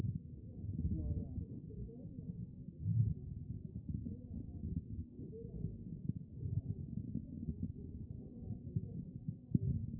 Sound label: A CHEST; B MODE